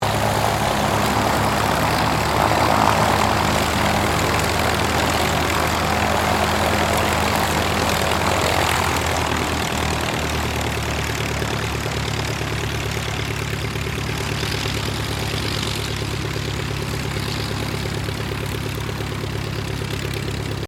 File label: AIRCRAFT AUSTER III PREFLIGHT; Airplane; Vehicles; Airplane Sound Effects